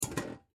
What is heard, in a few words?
Fuse Box Open Door, Fuse Box Open Door Panel; Household; Basement